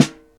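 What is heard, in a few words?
snare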